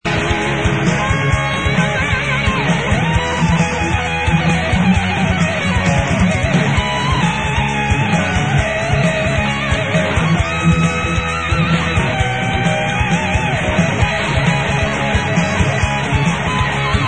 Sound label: solo